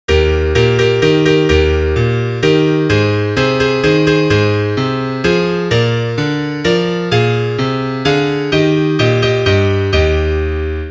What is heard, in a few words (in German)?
Klavier Solo, trompete